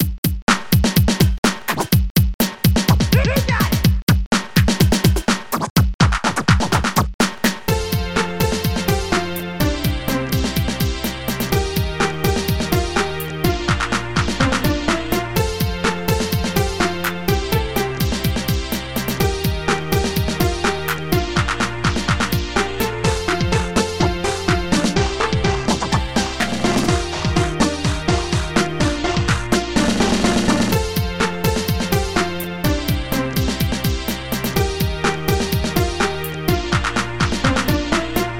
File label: intro music; OctaMED SoundStudio V1; mug-members